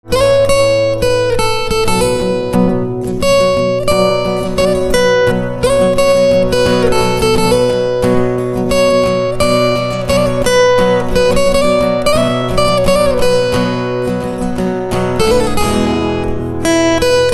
Guitar

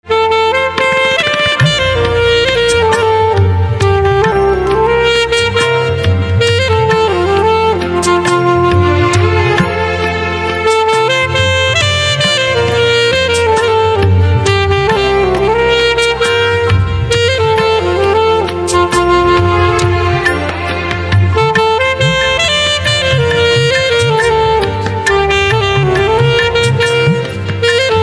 Saxophone

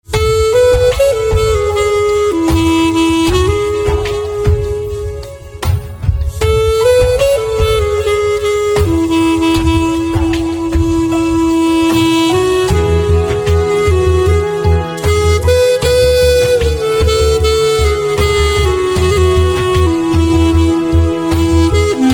Flute

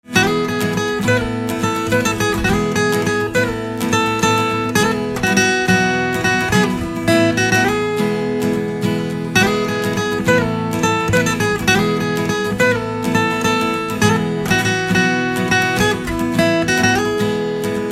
Guitar

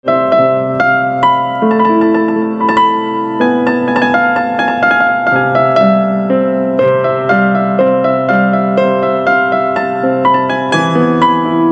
Piano Silent

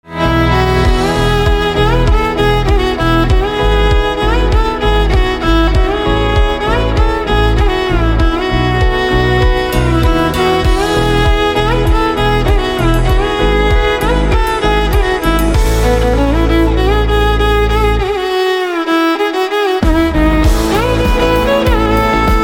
Violin

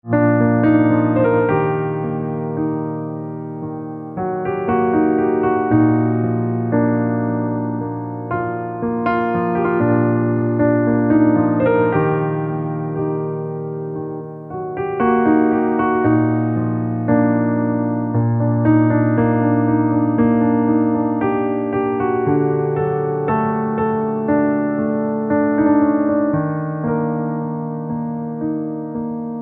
Piano Silent

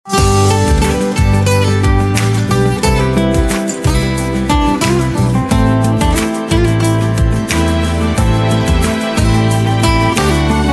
Guitar

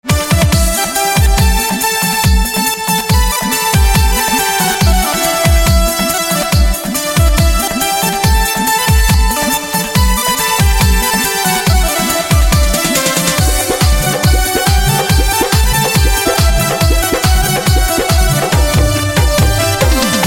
Saxophone

